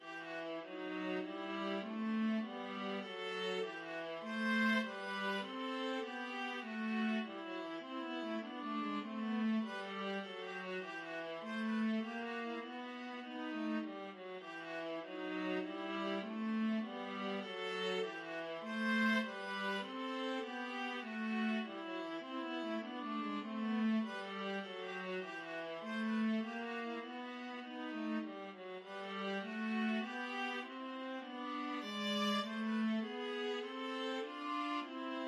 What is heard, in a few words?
Minuet viola duet; arne